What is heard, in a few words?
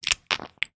horror eyes gouged out; sound effects one